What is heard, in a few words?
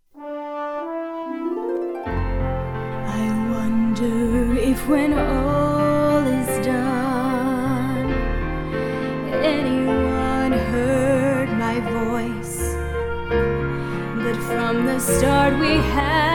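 Female singer; Sound Alike Tracks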